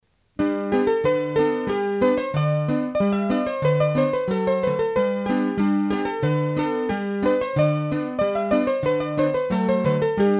Scottische a Cadet, hurdy-gurdy; Massif Central

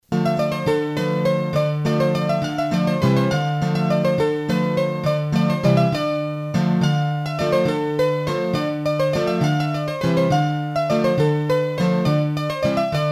Milk Shake, hurdy gurdy, Bourrees a 3 Temp

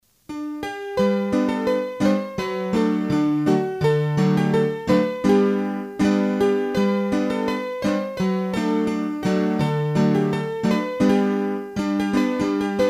La Gigue, hurdy-gurdy

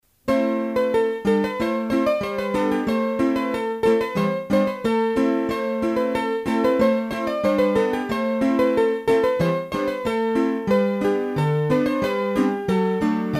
Les Cloches, Scottishes, hurdy-gurdy; Massif Central

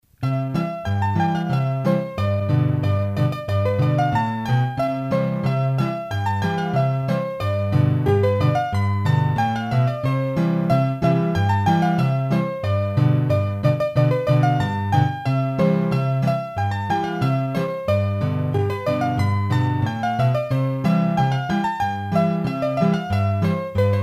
Scottische, hurdy-gurdy

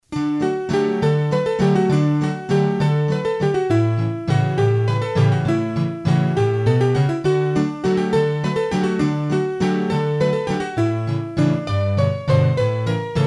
Valses, hurdy-gurdy; Villapourcon; trad

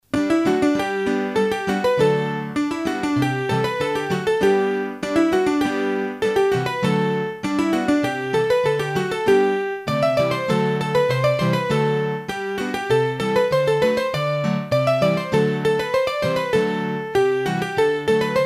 Tienne de la Barret trad, hurdy-gurdy; Massif Central

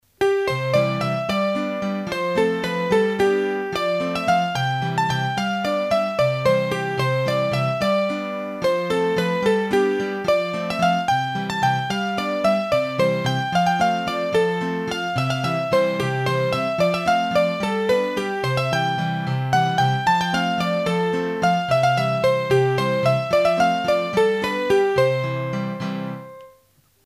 Valses, hurdy-gurdy; Massif Central

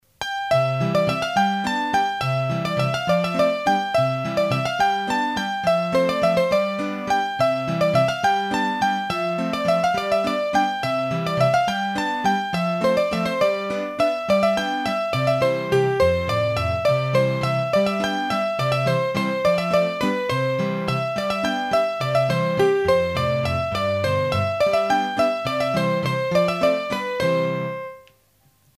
Bourree, hurdy-gurdy, Auvergne; Massif Central; Bourees d'Auvergne

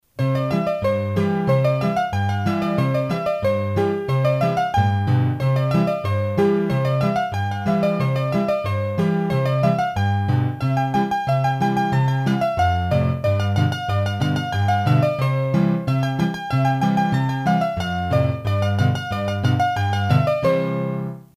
Scottishes, hurdy gurdy; Ches la Mere Antoine

